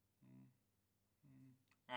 Ride

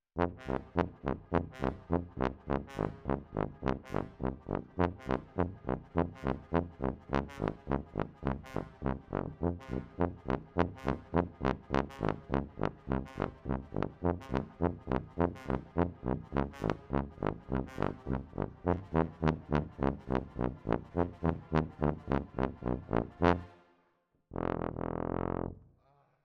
Tuba, jazz for piano

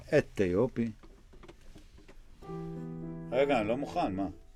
C Bass

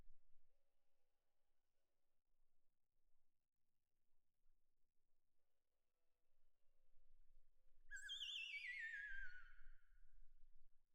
Perc Main

